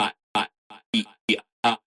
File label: VOX LOOP